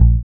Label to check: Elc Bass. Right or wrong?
Right